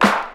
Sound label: fresh short clap single shot G# key; percussion; single hits one shots